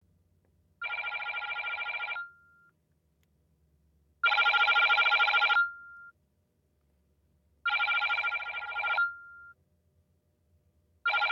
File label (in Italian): suoneria, olofonia